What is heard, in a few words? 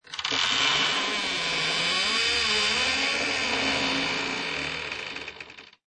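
Heavy Door Creak, Creaky door opening; Heavy Door Creak and Shut - with reverb. Heavy door close or gate close, creak shut and slam close, with heavy reverb as in a castl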